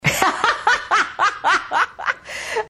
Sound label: Cackle; Hillary